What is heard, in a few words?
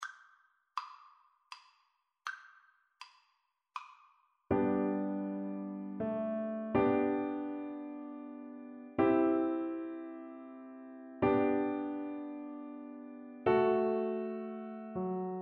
bach minuet FL kar, Flute